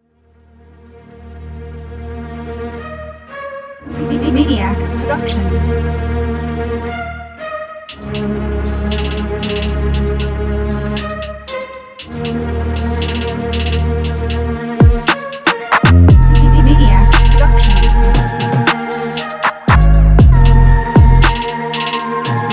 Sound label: Purple Guitar